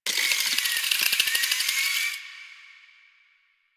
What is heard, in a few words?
Cave Hi Tech Clicks; Warframe; Ambience; Stalker